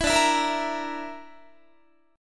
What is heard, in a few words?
D Suspended 2nd Sharp 5th D, Guitar; Dsus2#5; strummed; JGuitar